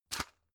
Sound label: drug pull out hard; berufe; arzt